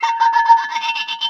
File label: Wizard Mini Laugh